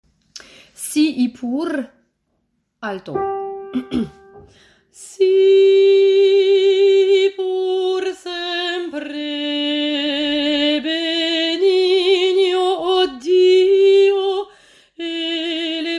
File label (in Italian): Coro Si pur sempre Alto